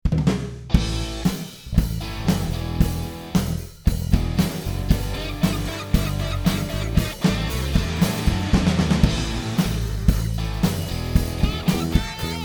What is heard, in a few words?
Ludwig Simple Rock